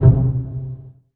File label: DOUBLEBASS; ILIO; Kirk Hunter Virtuoso Strings VOL-2; 90 s Sample CDs; 5DOUBLEBASS